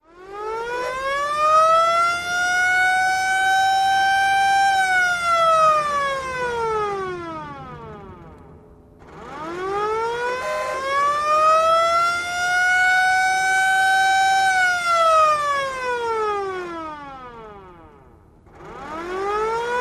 Hand-turned Siren: Operating At Various Speeds. Stereo; sirens